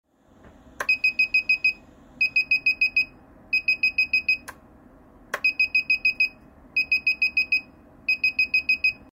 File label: beeper